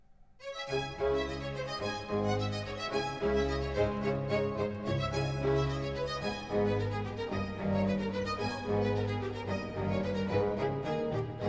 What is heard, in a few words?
coro